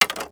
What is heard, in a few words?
bones; skyrim